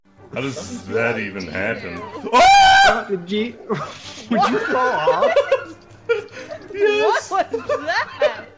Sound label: scream